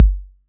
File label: BD 808